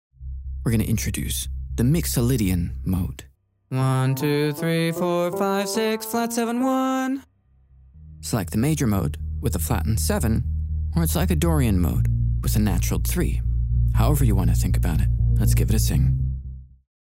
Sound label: Vocal Mixolydian Demonstration